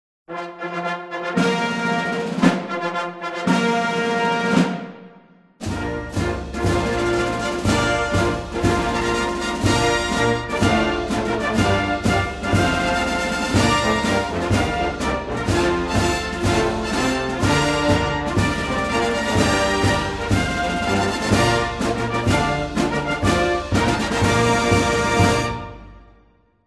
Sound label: Ruffles and Flourishes General's March 3 star; Ceremony Music